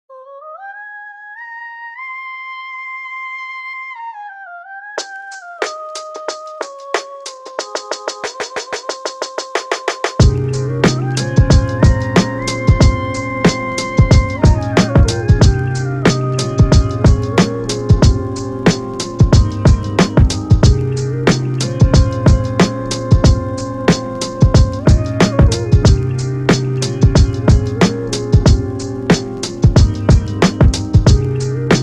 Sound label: vintage boom 16 bars beat